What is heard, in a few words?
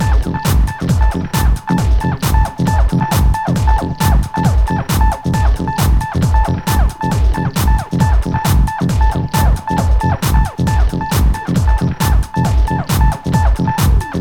Poot 135bpm